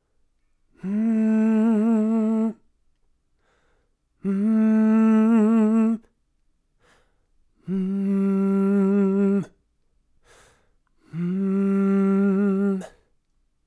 Soul Vocals Am 140 BPM, Melodies; 99Sounds x Monosounds

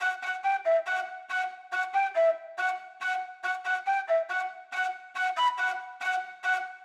Flute 140BPM Emin; DragNdRop; 99Sounds x Monosounds; Instrument Loops

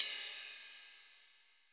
ride, Roland MC-909

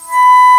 Alto Flute p B3; Digital Sound Factory Virtuoso

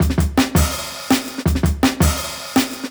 165, Jungle Breaks; 90s Sample CDs; Original Jungle Breaks

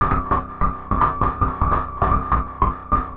Atonaloop, Industrial Analog

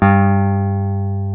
Jazz Guitar; Club-50 - Foundations; Jaz-Kessel

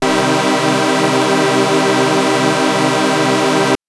Synth PulsePad min c2; TrashLibrary

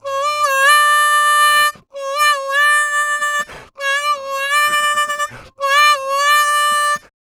Harm 129bpm G, Harmonica

